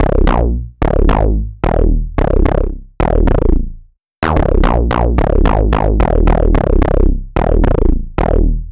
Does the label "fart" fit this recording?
No